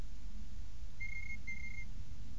two note trill

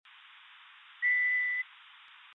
hollow whistle, night parrot

